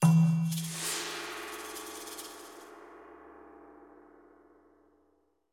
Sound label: Action END